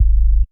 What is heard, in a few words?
Sub, drum